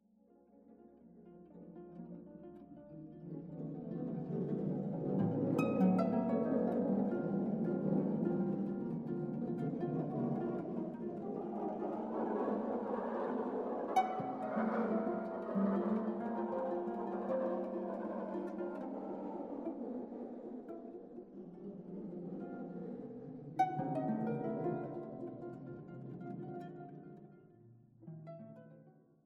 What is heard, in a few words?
harp tape